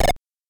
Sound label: get Gold; loderunner; APPLE2